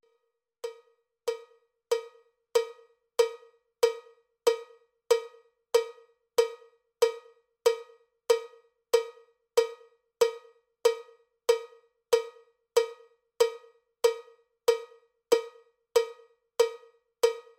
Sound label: cowbell click track bpm 79; cowbell click track bpm 94